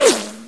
whiz, sniper; hl2

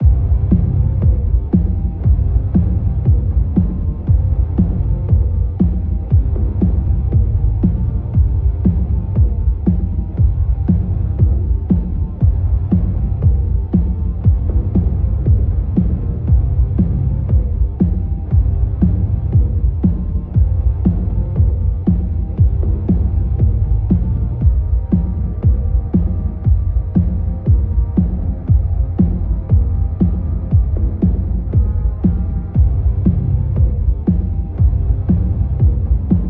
Music Spill; TheWorkRoom Audio Post

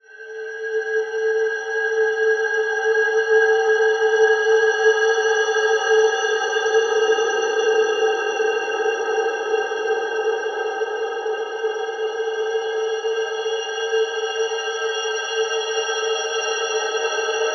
Dark Ambience Drone High; Horror & Tension Elements; The Ring; Systematic Sound; Tonal Elements Atrox; Game Audio Bundle

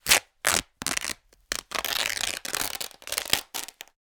Cotton Rips, Torn T-Shirt; InMotionAudio

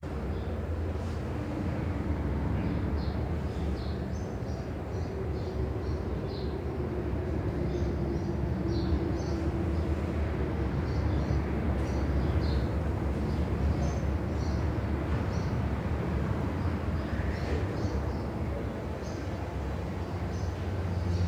Room Tone, Window Open, Walla, Spanish, Traffic; Jake Fielding; Sounds of Spain

